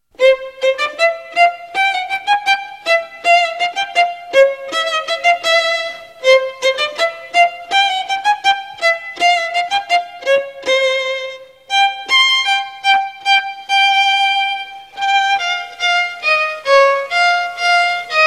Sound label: Fiddler Instrumental